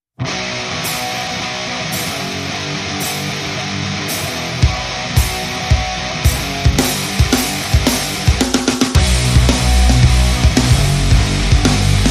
No Guitar Solos

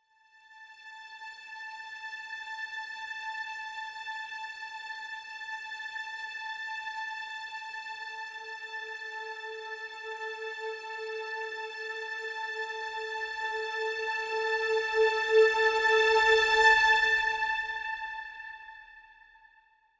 Simple String (A)

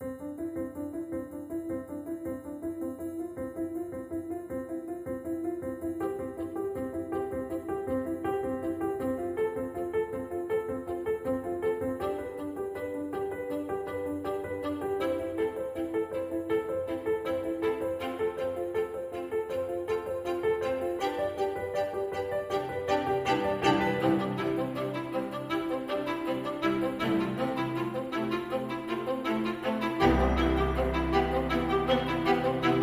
Uplifting Piano

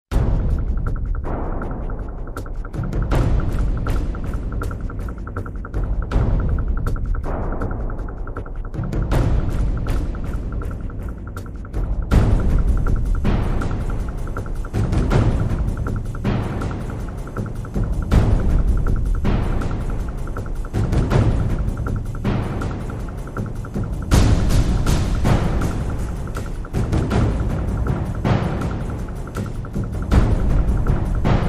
Drums

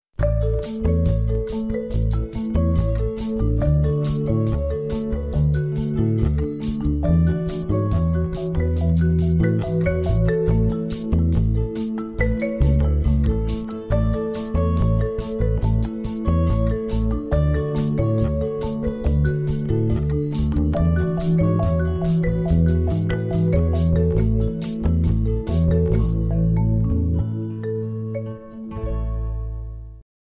Harefoot Marimba

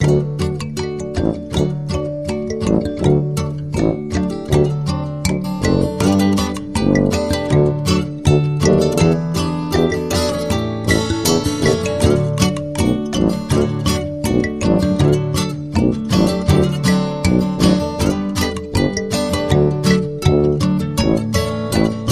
Jingle Bell Jump; Trad (PD)

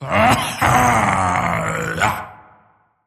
Vocal Fury Viking Laugh

